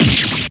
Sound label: stab